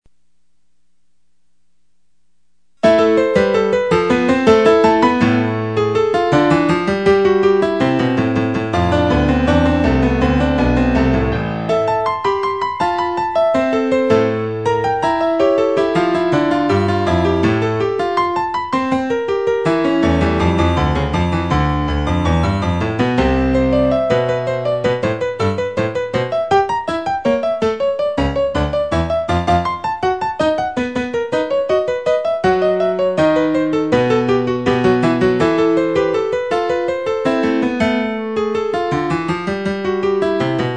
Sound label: piano